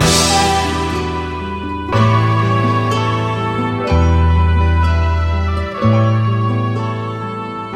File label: keys, sad loops